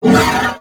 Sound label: Horse Vocal Grunt Breath Short; Vehicles; Vocals; Warframe; Duviri